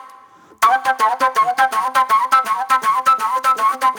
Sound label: Spit Plate Flute; Sonic Foundry (Sony Creative Software); Manhattan Flutes; Session 03 - Classic Caddy